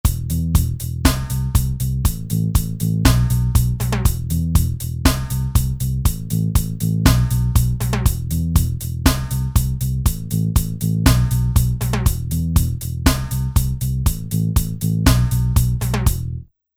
Ska

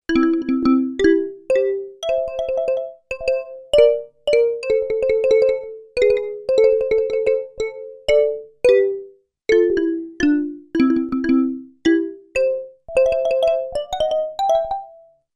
MARIMBA, Korg DW-8000; Factory Patches

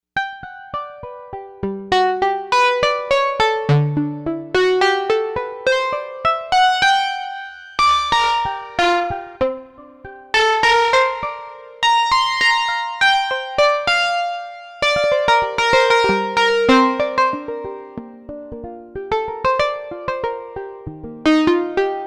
4 Op Fm Keys, Novation UltraNova; Factory Patches

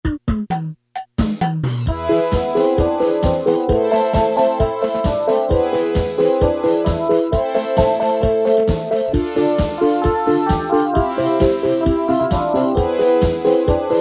Disco 2

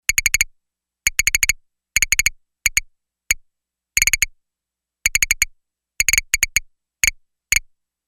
Castanet, Yamaha DX11; Factory Preset Voices

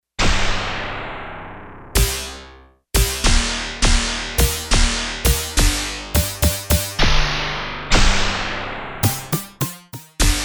PPG Punch; Digital Synthesizer

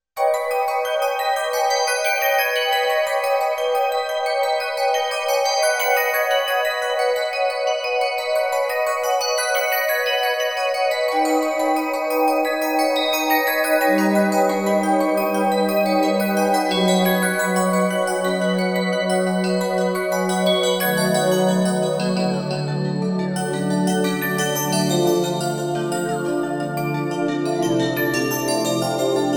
Cascade Bells

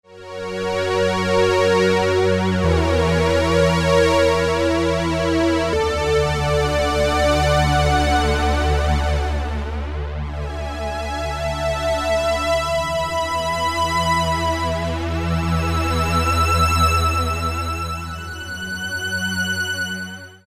LongString, Roland MKS-50; Factory examples

